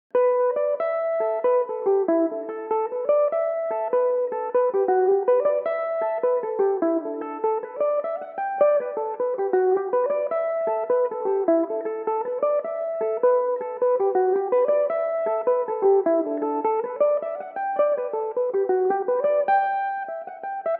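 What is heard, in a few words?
A dorian G major belmuse, irish mandolin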